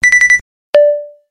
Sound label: Notification